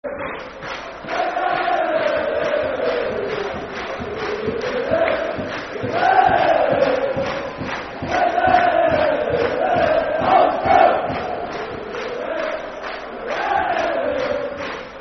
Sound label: Ulster, Football Chant (Soccer Song), N. Ireland